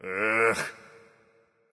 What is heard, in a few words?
grunt ugh; bacchus